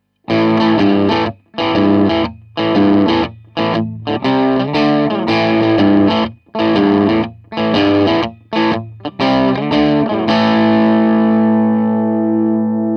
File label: telecaster crunch